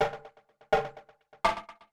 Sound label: PERC LOOP INDST 125, Industrial Loop